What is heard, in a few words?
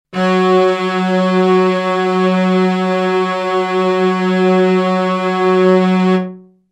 Cellos Quartet; synth patches Korg Prologue; Dystopia